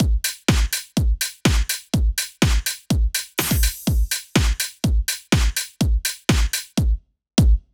beat pied saute

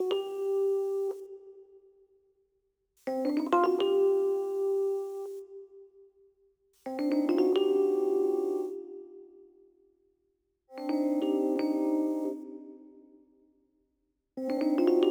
melodie piano elec

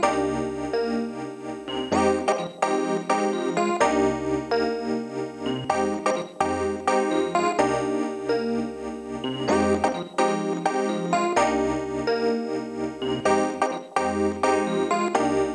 synthe full

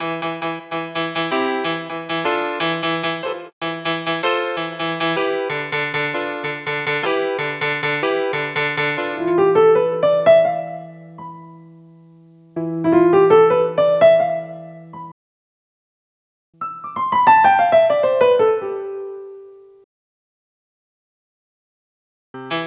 piano full temps guitare

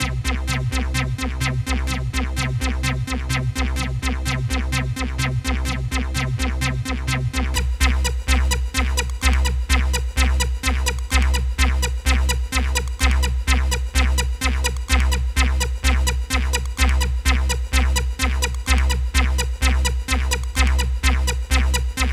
synthe acid long

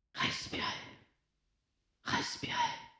RESPIRER

